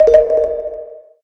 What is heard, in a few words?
lap; Wacky Races - Sling Races; NextlevelGames